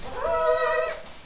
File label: kids chorus